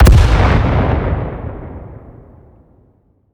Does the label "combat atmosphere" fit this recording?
Yes